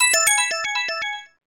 text tone ringtone; persona 5

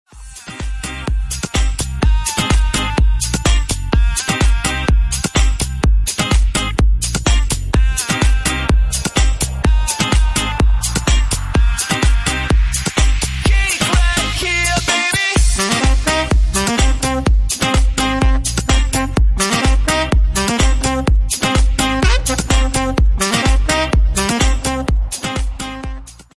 romanian pop music

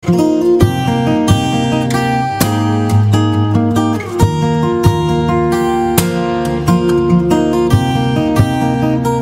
guitar ringtone

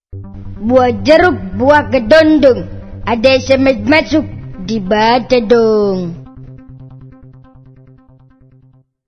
sms; sinchan

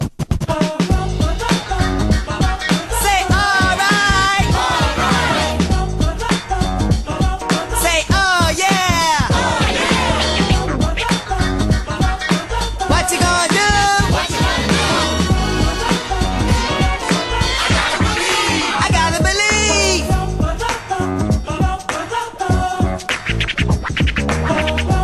menu theme; parappa 2

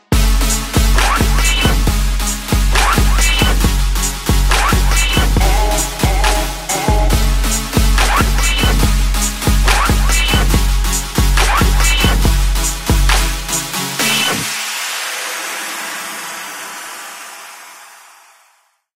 bass trap ringtone

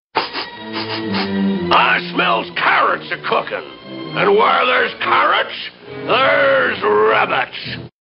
horror text message ringtone

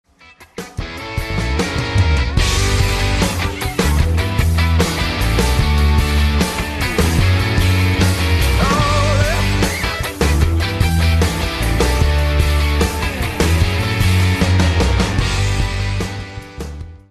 guitar ringtone

